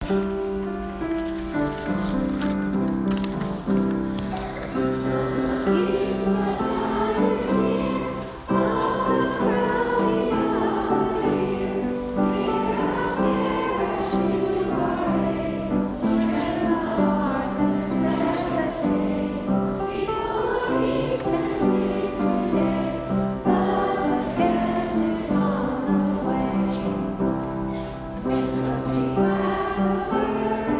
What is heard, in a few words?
people look east sung by; smmschoolomaha